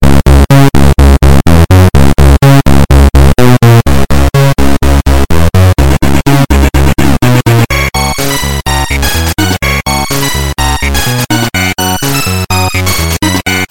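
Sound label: Commodore 64 music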